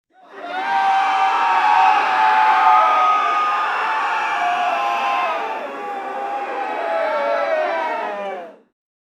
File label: gavel bang crowd yelling; law